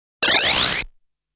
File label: shooting sound